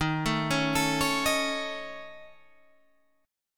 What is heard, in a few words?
A Major Flat 5th, D sharp